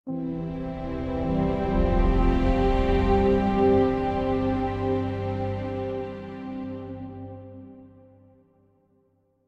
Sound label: Location Jingle New Lore Station; theHunter: Call of the Wild